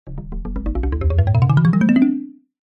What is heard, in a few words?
cartoon ascend climb med slow mallet; zapsplat